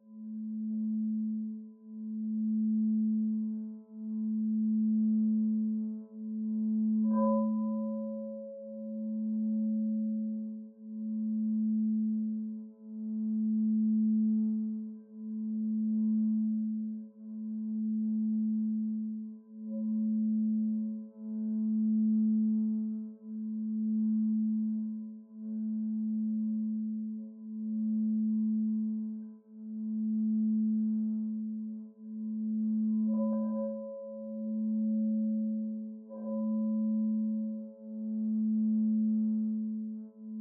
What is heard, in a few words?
quartz crystal singing bowl 13 inch a rub rim suede mallet